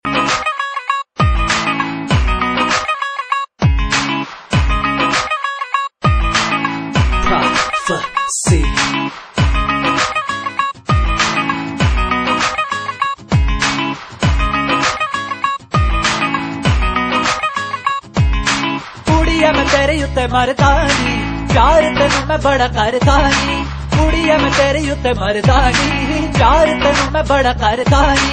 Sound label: Panjabi